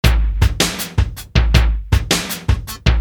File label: live kit rock beat; esoteric